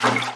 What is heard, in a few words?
throwable slosh; weapons; breadmonster